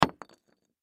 Impact Ceramic Piece Drop; Drops; Sound Effects:Objects; Blastwave

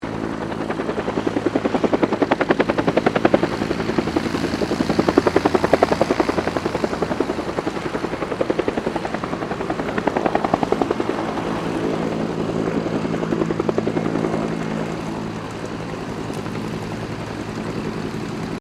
HELICOPTER 1967 BELL UH1 IROQUIOS PASS

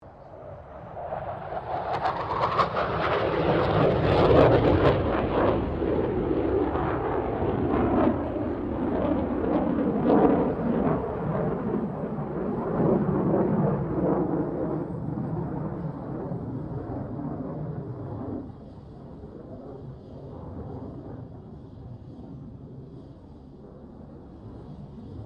AIRCRAFT FA-18 HORNET PASS

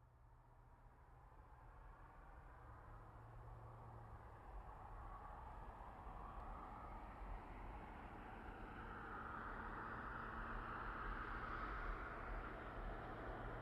Truck GMC Savana By Fast Revving; Trucks; Vehicles; Big Room Sound

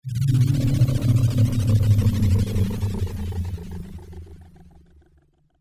Sci Fi Spaceship; Sci Fi Spaceship Rumble Stutter Descend; Spacecrafts

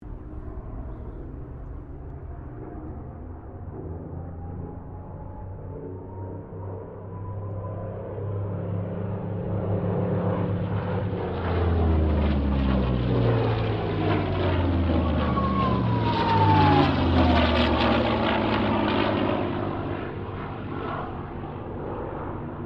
Spitfire Mustang Kittyhawk pass; Mixed aircraft squadrons; Airplanes; Vehicles

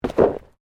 Body movement Impact Roll Road

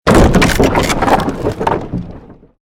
created wooden structure crash medium

